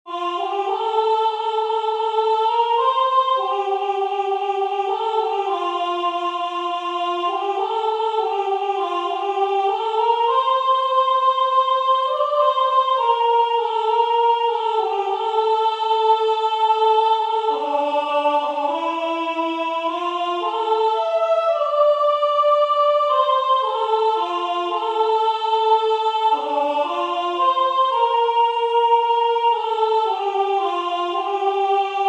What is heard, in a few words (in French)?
Chante en mon coeur pays aimé Soprano